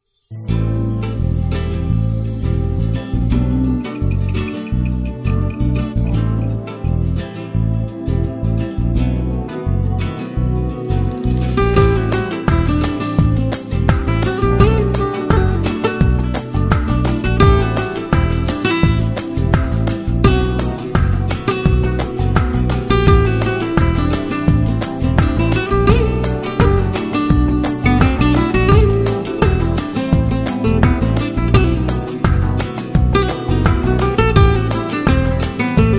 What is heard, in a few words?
flamenco